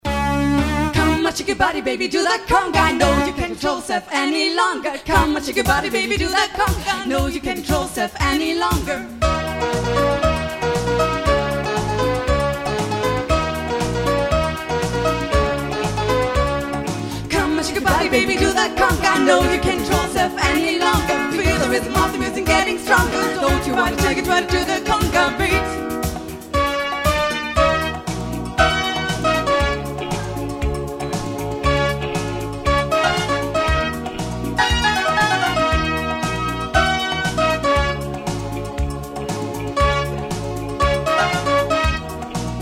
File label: conga; sunday band